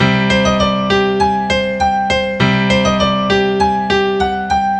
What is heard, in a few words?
Hungarian Piano Ringtone